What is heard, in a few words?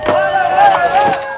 Ambiences, Places and Ambience